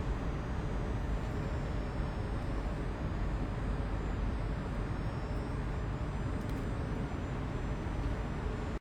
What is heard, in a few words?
tram station; Golden Crayon Sounds